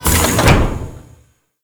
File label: Galleon Door Close; Grineer